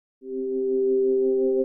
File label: HOUSE FX 125